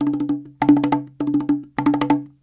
Cahon

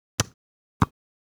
soccer ball kick; Butterfinger Video Sound Effects; Soccer Running Chainlink and Huh

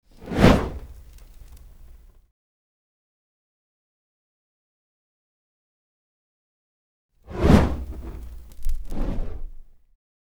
fire flame woosh; Asian Show Sound Effects

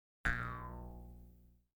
electronic twangs; Asian Show Sound Effects

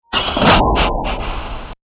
jail door

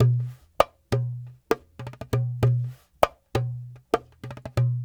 Dumbek

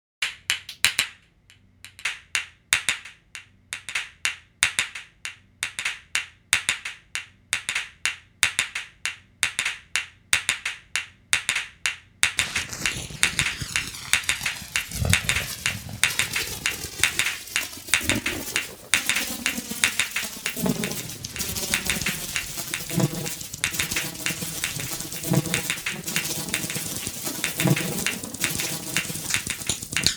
rock

